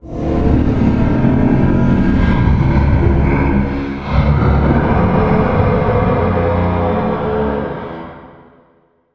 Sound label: jumpscare mansion gameover theme; spookys jumpscare mansion